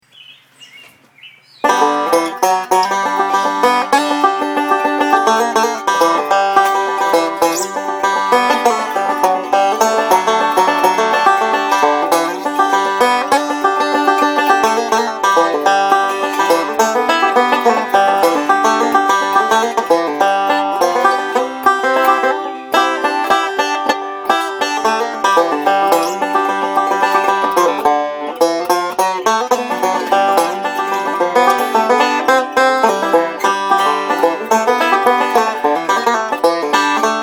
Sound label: banjo